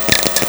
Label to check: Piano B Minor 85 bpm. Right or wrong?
Wrong